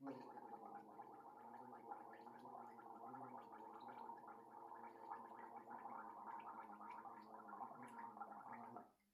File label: Gargle And Spit